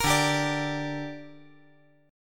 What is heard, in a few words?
D Suspended 4th Sharp 5th D down, chord